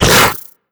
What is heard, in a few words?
grunt hard, headcrab; creatures; vox